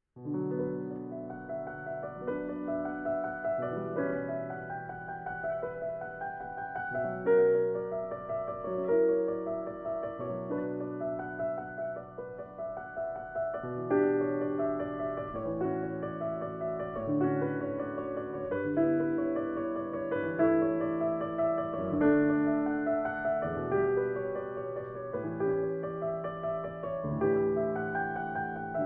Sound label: Prelude B Minor, BWV 855a; Transcr. By Alexander Siloti